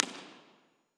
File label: Conrad Prebys Concert Hall Seat F111, Impulse Response; EchoThief Impulse Response Library